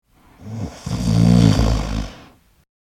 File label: snore